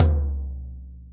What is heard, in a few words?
Tom BD